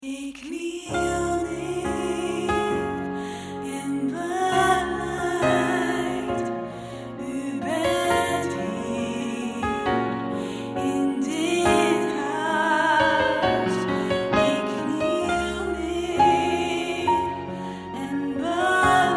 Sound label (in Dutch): Alt, choir; Presence op het Malieveld